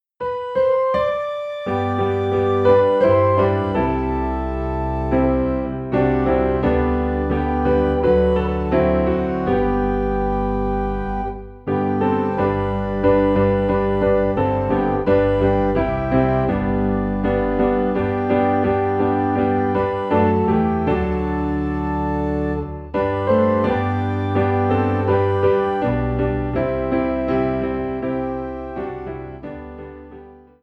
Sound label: Piano and Organ in G; My Savior First of All